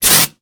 Aerosol Can, Bathroom; 1001 Sound Effects

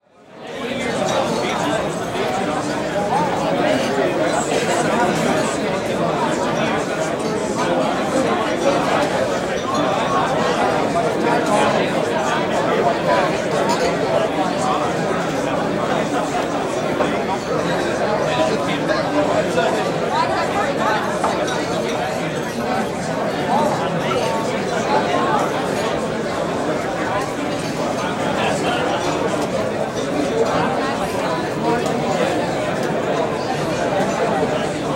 Bar Pub Busy Bar, Walla; Sound Ideas; Walla Series

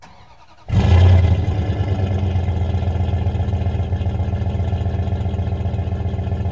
Truck Durango Start Loop; Trucks

